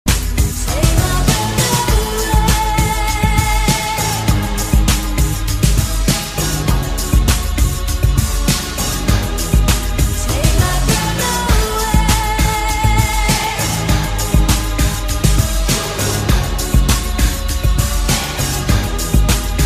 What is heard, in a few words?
remix